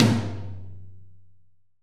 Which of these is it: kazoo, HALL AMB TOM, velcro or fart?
HALL AMB TOM